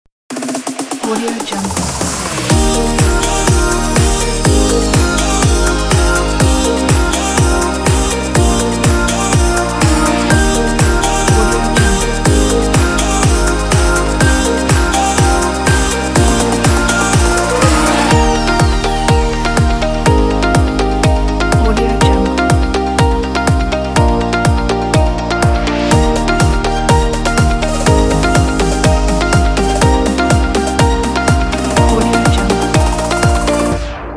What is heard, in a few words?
uplifting happy pop